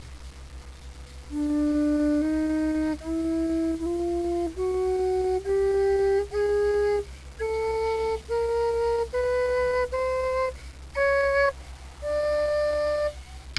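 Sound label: chromatic whistle; jubilee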